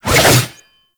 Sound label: Bayonet Melee Spin Fast; Warframe; Tenno